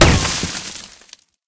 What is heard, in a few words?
dirt, bullet collide